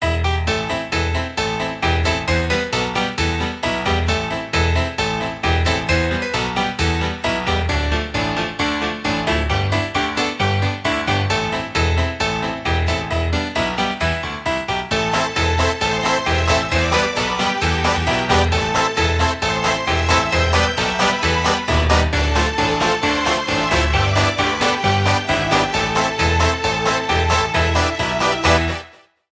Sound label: ringtone fun piano, instrumental instrument solo